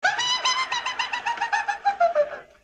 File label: pipipipipi